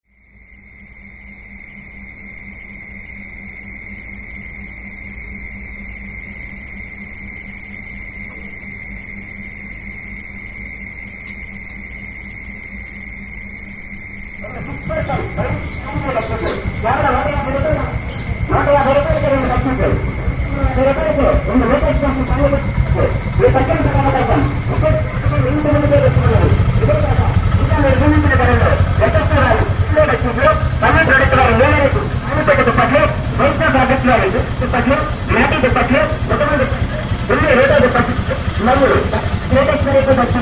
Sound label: soundscape from Mumbai to Auroville